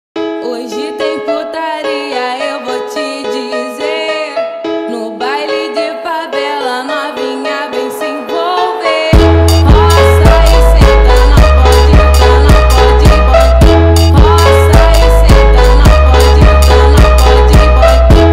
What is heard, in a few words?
Phonk SAD FUNK